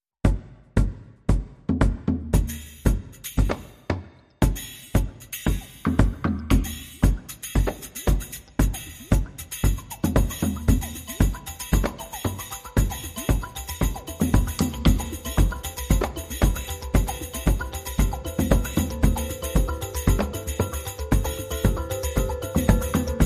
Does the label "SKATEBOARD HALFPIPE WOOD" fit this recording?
No